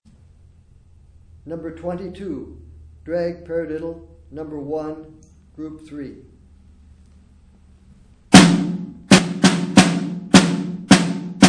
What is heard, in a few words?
snare rudiment